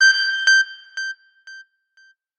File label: Business Sms Tone